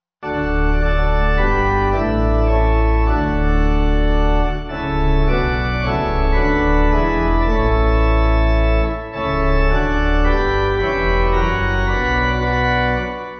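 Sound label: Pipe Organ; O Bride Of Christ; Fliehen Hin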